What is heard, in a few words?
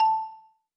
Tone Button; UI